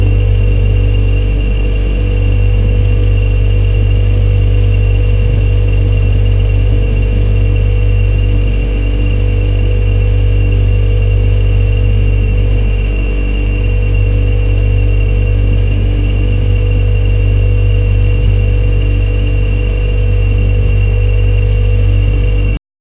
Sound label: old fridge loud noise